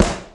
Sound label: attack melee